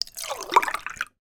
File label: milk poured into glass; food drink